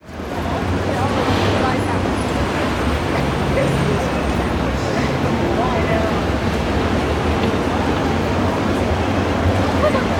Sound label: Rhone and Arve rivers City